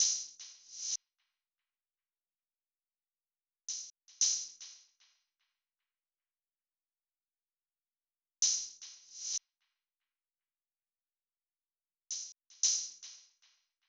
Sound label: Drum Loop 114 BPM Open Hat; Loop Stems; Cymatics; Vibes II Drum Loop Collection; Prize